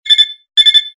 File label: beep high pitched warble signal